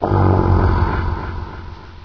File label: recharge; Source Engine; bshift